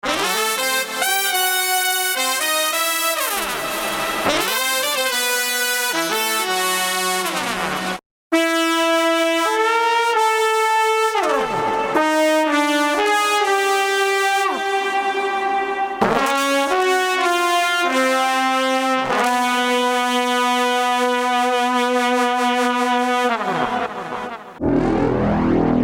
Brass Collection Trumpet Horns French Horns Flugel Horns Trombone Solo Trumpet